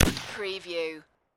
Rifle Winchester 30-30 Single Shot Exterior At 45ft